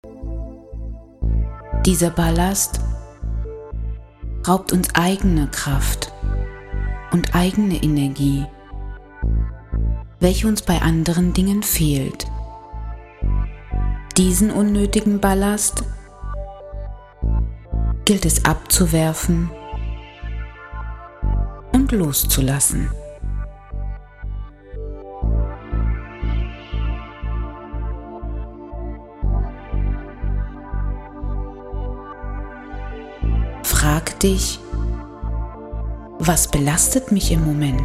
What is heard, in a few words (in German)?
hoerprobe trance